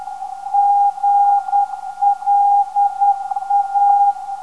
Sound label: 373.5kHz, NDB; GLA